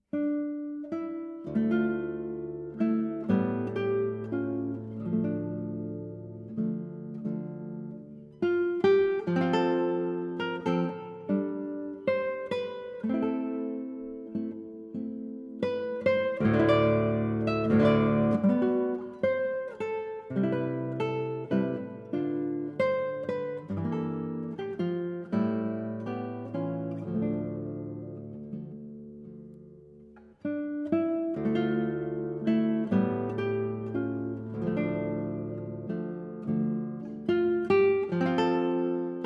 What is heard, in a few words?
Guitar Solo